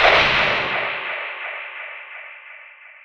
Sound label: arc gesture; spectre